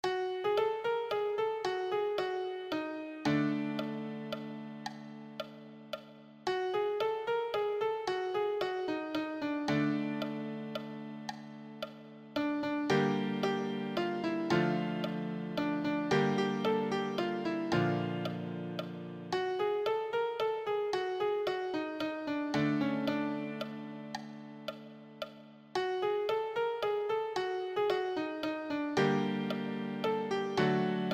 easy solo, piano

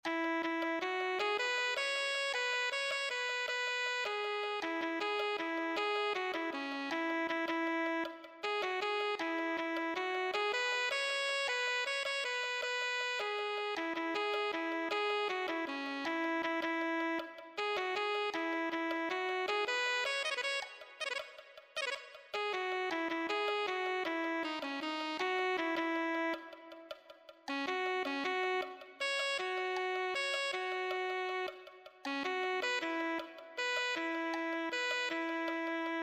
alto saxophone

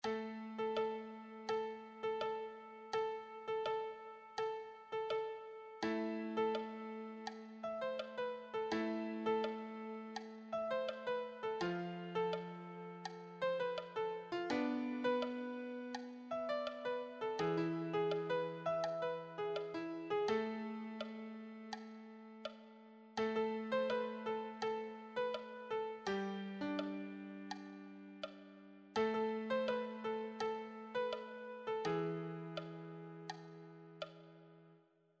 beginner solo, piano

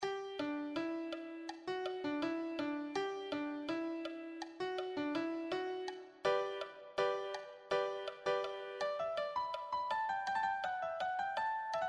melody

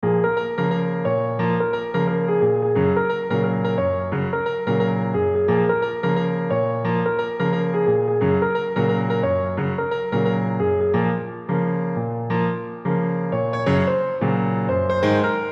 solo, piano; Boys

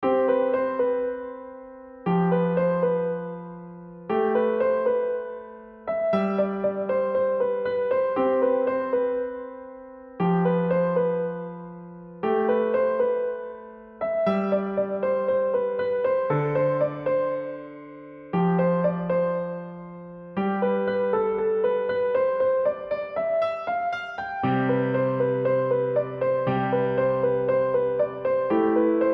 solo, piano; Agent Vinod

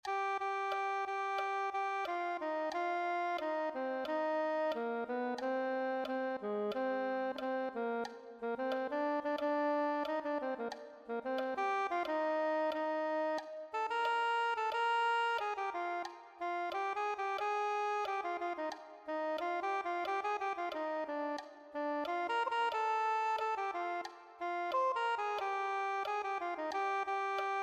soprano saxophone

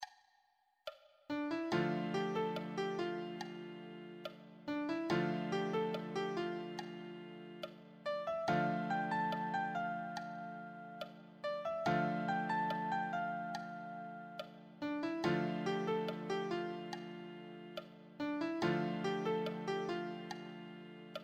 easy solo, piano

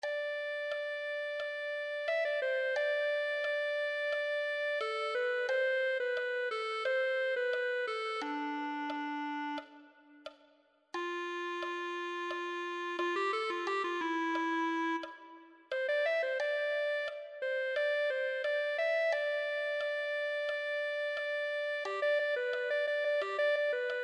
clarinet

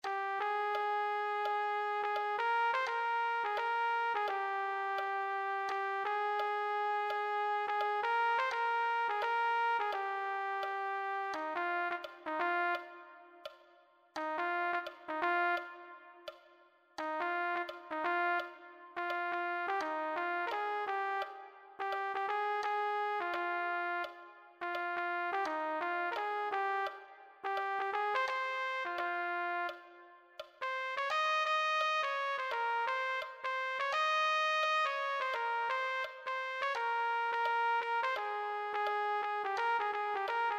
trumpet

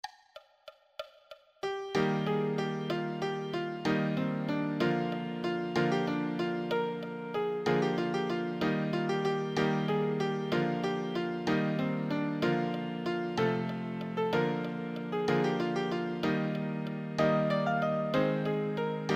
easy solo, piano

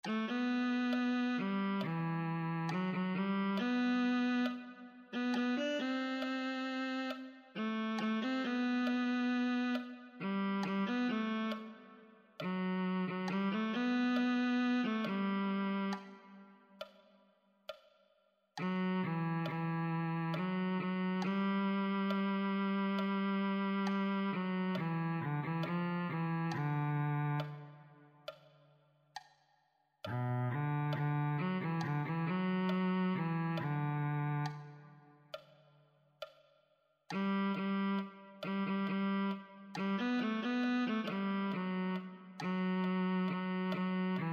viola

